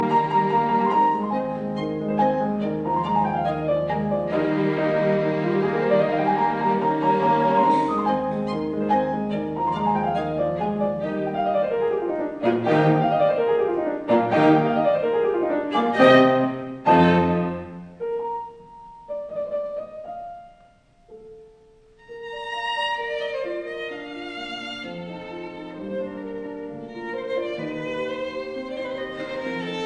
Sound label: Piano Quartet in E flat major Allegro; K 493; Budapest Quartet